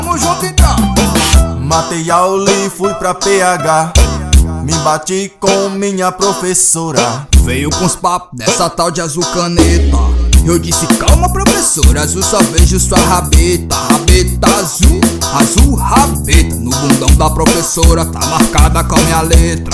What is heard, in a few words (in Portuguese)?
brega funk